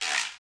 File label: Guiro Wood; Club-50 - Foundations Roland